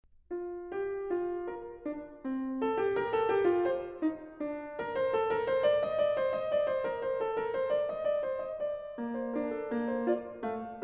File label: Fugue in B-flat, The Well-Tempered Clavier; BWV 866; The Well-Tempered Clavier, Book 1; Angela Hewitt